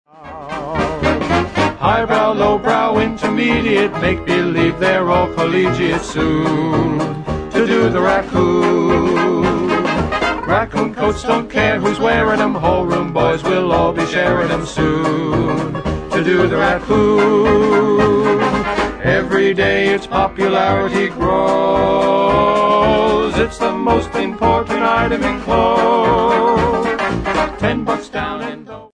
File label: vocal